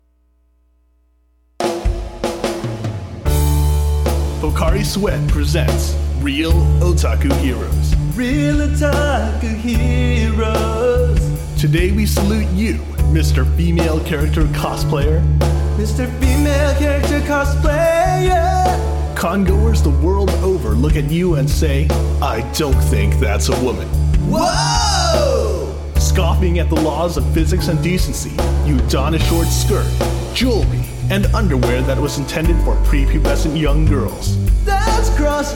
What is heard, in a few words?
female